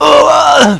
HIT; BattleSNDS

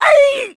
HIT; BattleSNDS

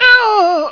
DYING; BattleSNDS

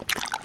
WATERSNAKE ATTACK

